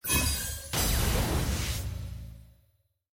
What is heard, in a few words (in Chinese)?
装备进阶成功; nba; 装备升级